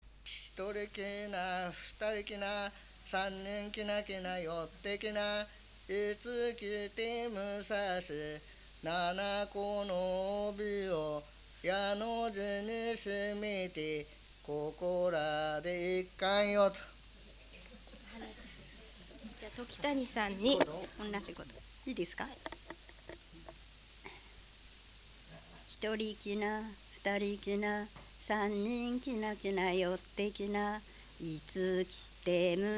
hanetuki, minyou; はねつき（おまんが腹には）; 市原市; 不入斗; 遊戯歌

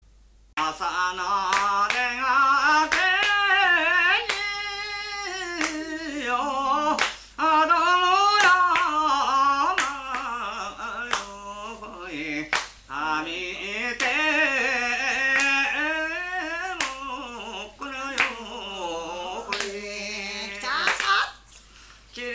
asanodegakeni, minyou; 南房総市; 朝の出がけに

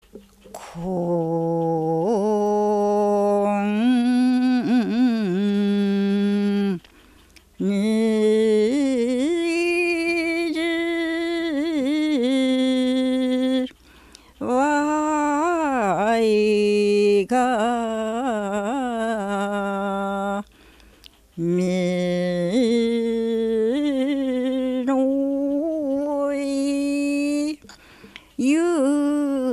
hanami kaminohikiai, minyou

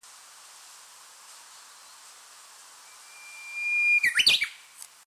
uguisu

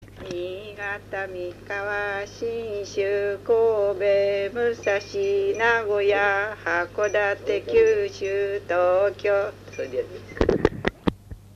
temariuta, minyou; 鋸南町; 保田; 遊戯歌; てまりうた

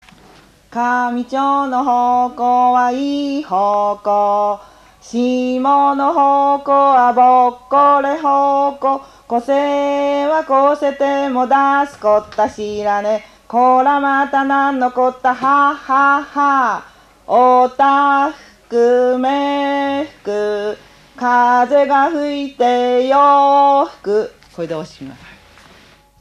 hokonohikiuta

